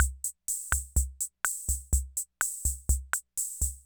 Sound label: Loop, classic machines; arranger